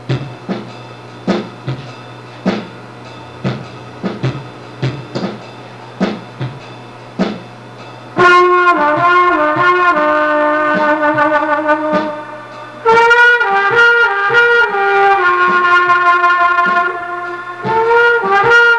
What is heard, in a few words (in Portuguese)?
sopro com batida